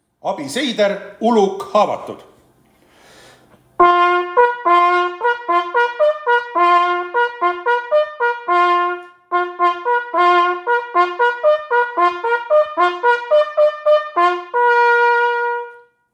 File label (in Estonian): uluk haavatud, Jahisarve võistluse signaalid